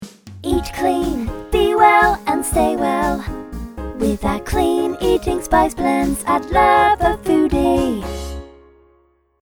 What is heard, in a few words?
JINGLE; LUVAFOODIE